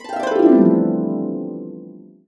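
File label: C major, harps; USB Soundscan vol.62; Complete Harps; AKAI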